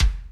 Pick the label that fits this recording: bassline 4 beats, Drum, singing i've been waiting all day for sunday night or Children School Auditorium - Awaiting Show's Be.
Drum